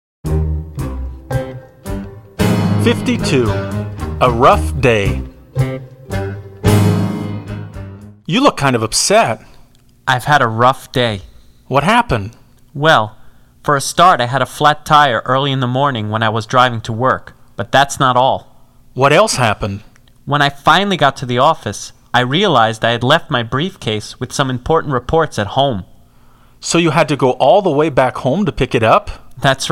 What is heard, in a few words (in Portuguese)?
Trilha